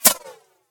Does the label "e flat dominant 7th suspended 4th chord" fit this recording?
No